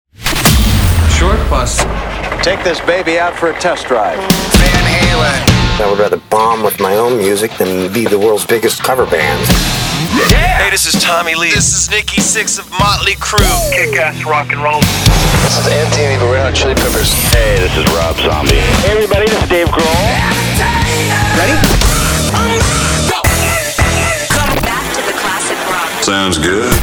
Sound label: Classic Rock